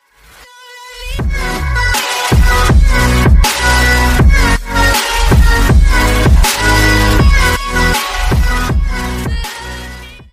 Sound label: twitch alert follower sub donation